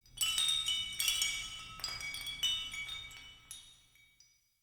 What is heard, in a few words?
Hut Interior Chimes; Ambience; Midrath Island; Grandfather